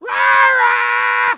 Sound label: Shout; Elevation